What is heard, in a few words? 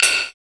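PER PROCU, Procussion, percussion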